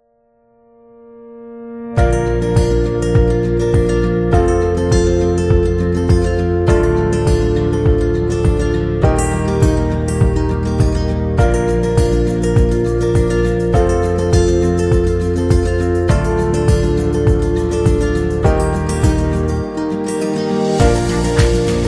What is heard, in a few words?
Upbeat Guitar; birthday music